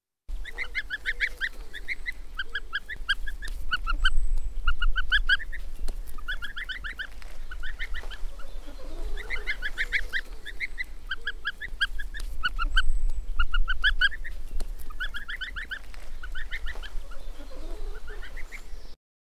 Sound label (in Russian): Ptenccy